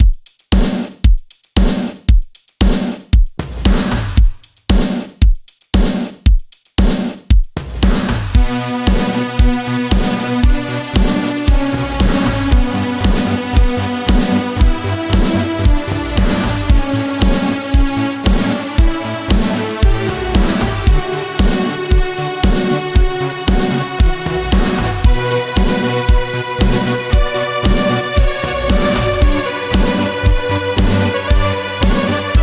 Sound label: bgm